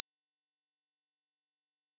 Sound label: sin 7500